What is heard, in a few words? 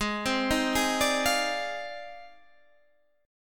F Minor 13th, G sharp